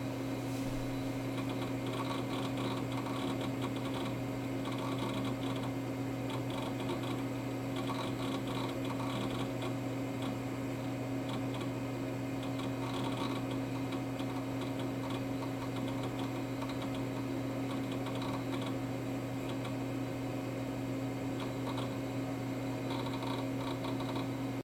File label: shelter computer loop; Original TK Sounds